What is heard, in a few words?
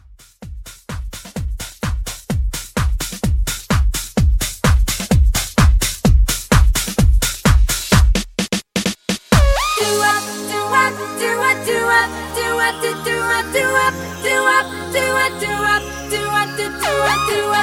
Tiktok Dance 128; remixplanet